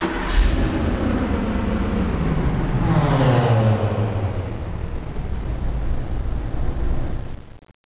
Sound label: car; Enciclopedia Del Perro